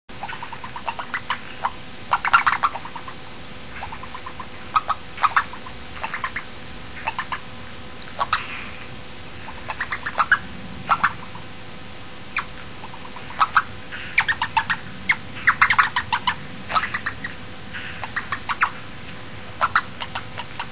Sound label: Rana longicrus